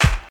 strong bottom end snare sound E key, Royality free snare sound tuned to the E note